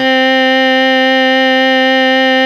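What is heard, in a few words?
electric organ 3 C4, Fairlight CMI IIe, keyboards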